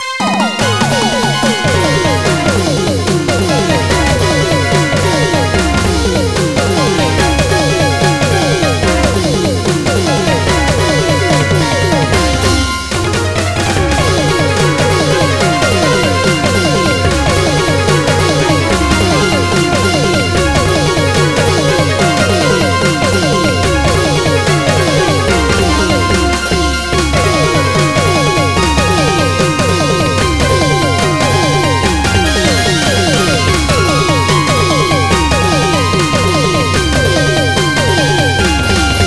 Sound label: chant; megaman